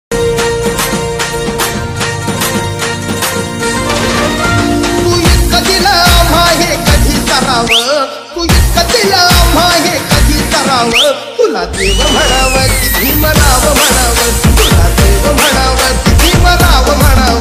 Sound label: ringtone